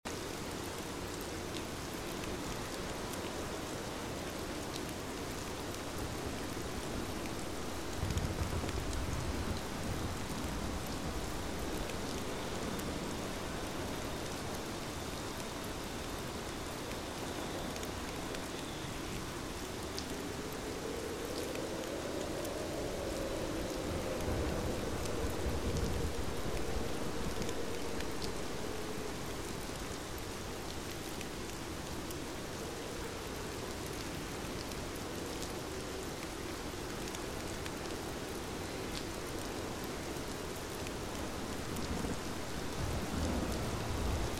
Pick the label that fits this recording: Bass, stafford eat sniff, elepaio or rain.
rain